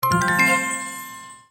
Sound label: win; Beauty Fairy